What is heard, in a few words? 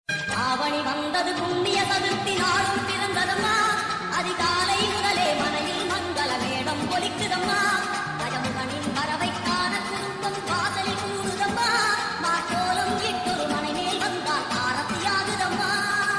ringtone, Tamil